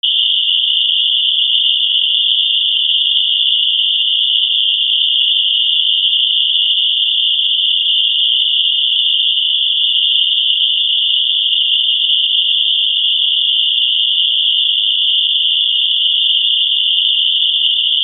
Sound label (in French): bruit rose